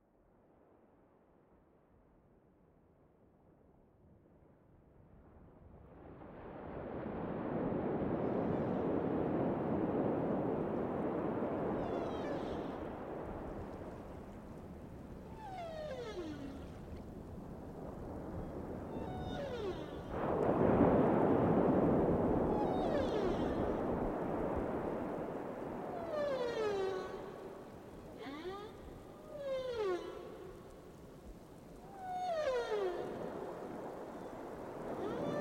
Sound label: Seaside Ambiance